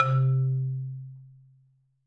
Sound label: C2, Marimba; hard F; Sampleheads - Dave Samuels Marimba & Vibes